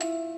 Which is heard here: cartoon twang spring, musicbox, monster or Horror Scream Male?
musicbox